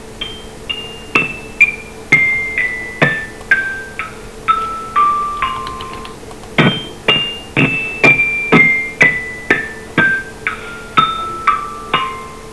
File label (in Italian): altezza strumenti